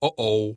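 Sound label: guy says "oou"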